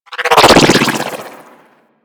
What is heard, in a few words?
Flak Drone Projectile By; Corpus